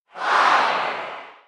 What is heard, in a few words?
Crowd; Rocket League